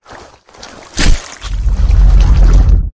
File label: unarmed melee; flood; carrier; predatorcz; halo